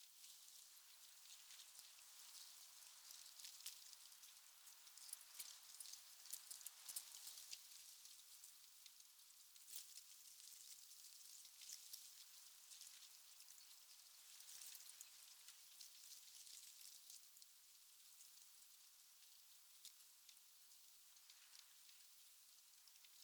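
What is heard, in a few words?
ambience leaves medium; Rust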